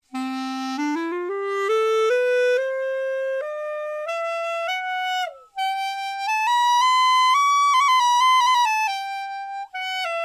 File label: clariphon